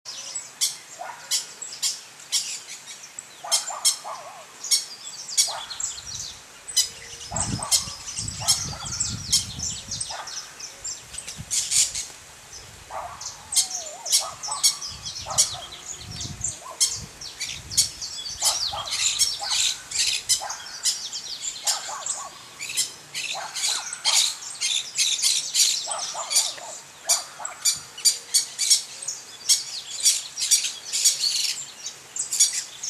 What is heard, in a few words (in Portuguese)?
Tiriba de Testa Vermelha